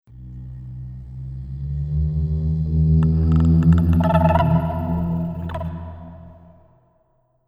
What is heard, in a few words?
moon moaning